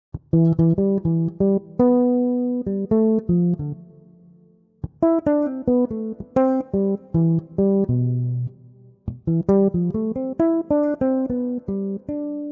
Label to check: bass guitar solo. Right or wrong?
Right